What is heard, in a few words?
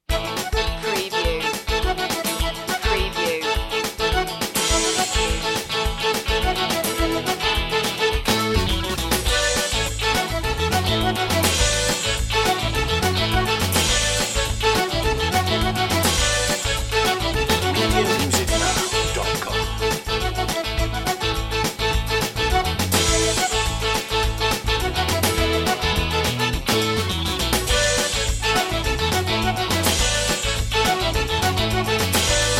stomp